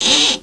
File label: Squeak; Princess and the Pea; SoftKey